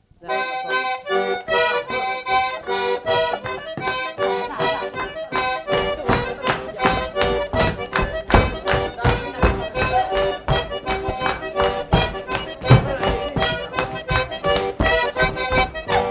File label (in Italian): TARANTELLA; calabria